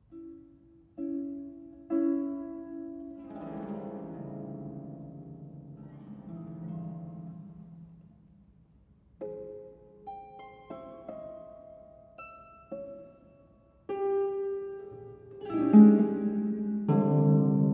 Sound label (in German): Bagatellen fur Harfe Solo